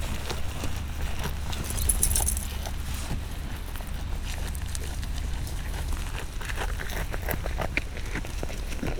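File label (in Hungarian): kecske eszik láncscsörgés; parasztudvar; hazikecske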